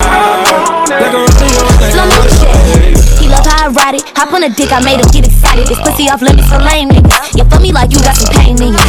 remix estribillo

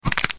hueso roto sonido

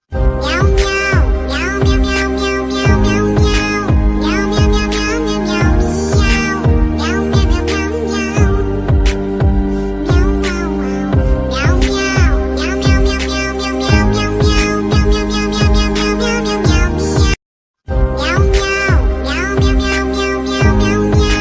miau miau miau miau

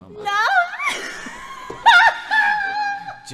risa

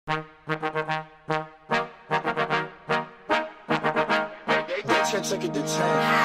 trompeta

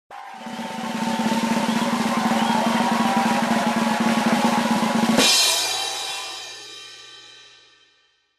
publico redoble tambor

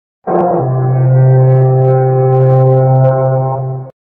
cuerno de guerra

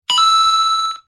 moneda

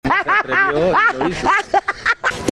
risa

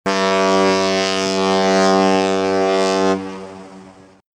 bocina barco

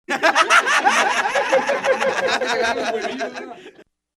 risa

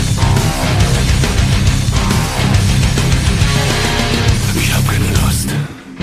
tambor

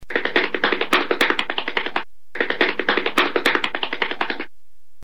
pasos

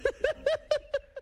risa graciosa

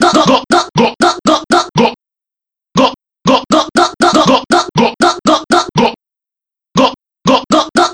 tono de llamada; gogogo

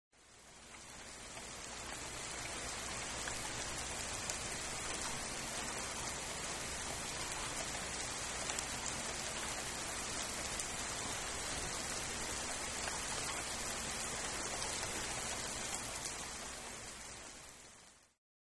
lluvia

